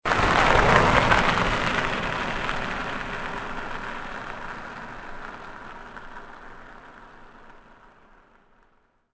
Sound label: car; Soldier Of Fortune II Double Helix; XBOX